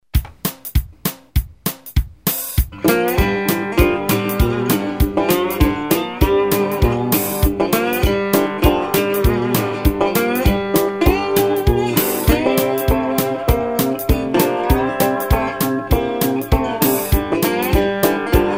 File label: blues shuffle